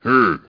grunt; Microsoft Graphics Studio Greetings; Microsoft 1998

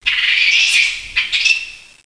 jungle; Renaissance

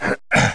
push male; Odyssey Demo

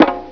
Flam; Prince of Persia 2 Demo

